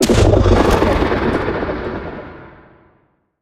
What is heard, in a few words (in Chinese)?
监守者音爆声